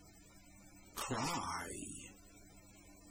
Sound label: cry